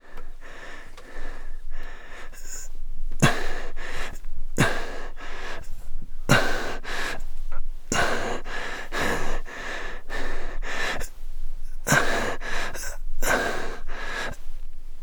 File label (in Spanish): respiraciones; o que arde